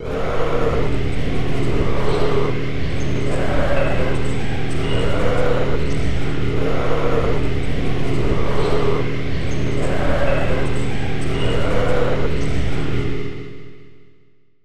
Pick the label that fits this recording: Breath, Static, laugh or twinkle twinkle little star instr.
Breath